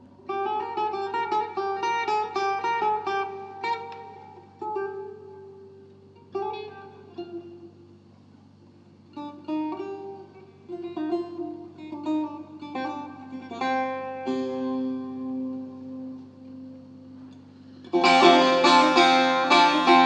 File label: Improvisation In Nava